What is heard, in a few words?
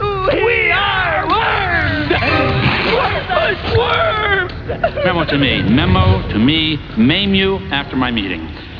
pain